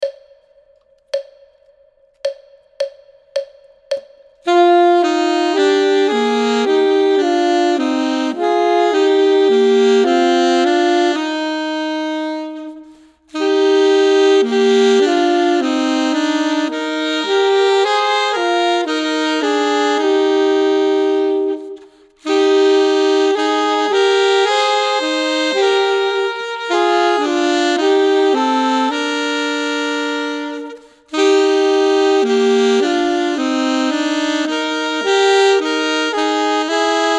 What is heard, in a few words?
duet; Ten Easy Duets